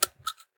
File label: Shell 12ga grass; STALKER; IWP